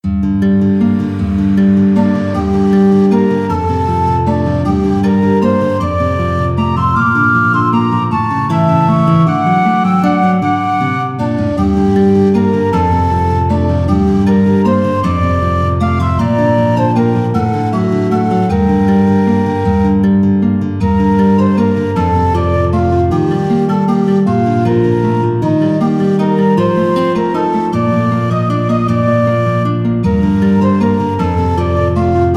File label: bgm